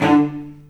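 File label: D2 arco short cello, right; East West; The Ultimate String Collection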